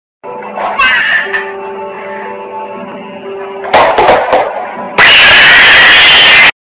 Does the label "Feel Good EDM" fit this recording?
No